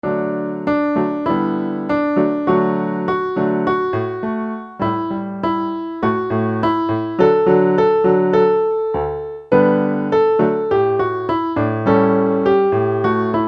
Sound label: Half Notes